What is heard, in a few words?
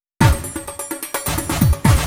Fill 128 BPM; Vengeance Samples; VEH1 Loops